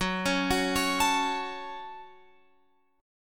Gb Minor, Guitar, Open F; arpeggiated; x,13,13,13,16,16

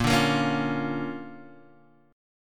D Minor Major 7th Sharp 5th, A sharp

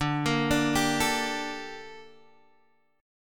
Bb Major 13th D

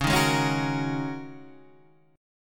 G sharp 7th Suspended 2nd Suspended 4th

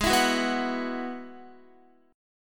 Ab Minor 7th Sharp 5th, Guitar; strummed; 4E,4A,5D,5G,5C,6F; x,11,14,11,11,13

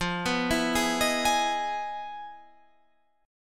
E Major 7th F; Emaj7/F; arpeggiated; JGuitar

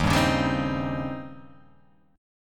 D sharp 13th D sharp

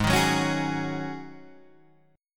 Ab 7th Suspended 4th Ab

